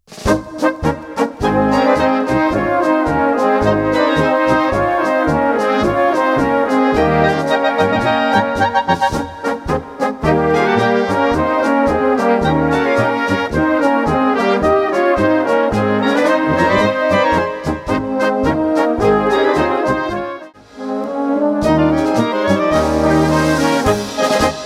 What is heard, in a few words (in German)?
Gute Laune mit Blasmusik